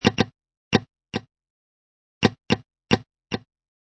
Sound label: Electric Guitar Muted A, Funk Electric guitar loop in A# at 110bpm.; Guitar Loops